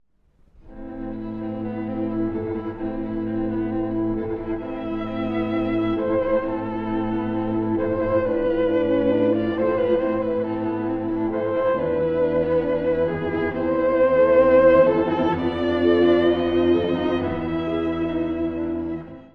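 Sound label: string quartet; a-moll; 憂愁; メランコリックな主題から始まる曲。; 弦四13番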